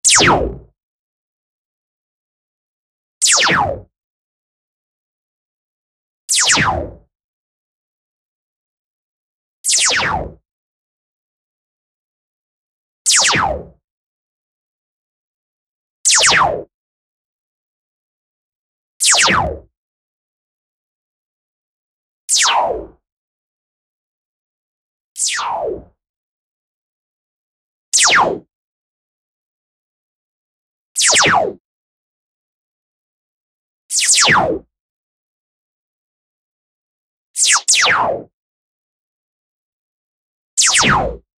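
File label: silenced pistol shoot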